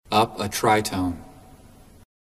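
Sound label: Ascending TriTone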